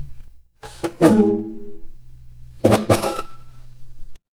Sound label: mechanical lever